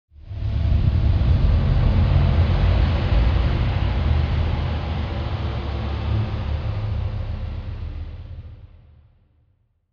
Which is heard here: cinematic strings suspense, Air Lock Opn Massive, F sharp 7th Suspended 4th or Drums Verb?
Air Lock Opn Massive